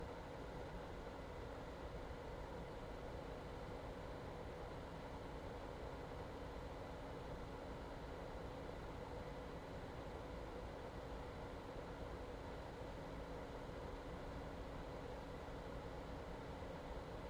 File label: Ventilation Fan, small box, metal, electric motor, disconnected, whirring, spinning, low intensity; loop; Eiravaein Works; Vaeyan IV